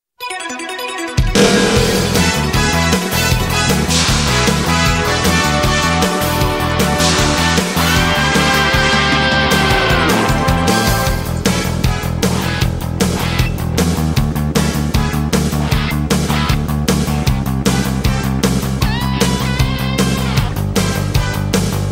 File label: TV-Size Instrumental; DBZ OP